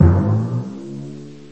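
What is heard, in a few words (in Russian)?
литавры одиночный восходящий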